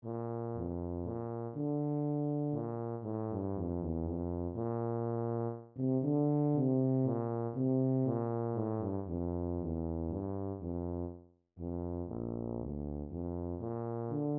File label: tuba